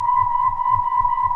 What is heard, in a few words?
B2, Bloogles Buz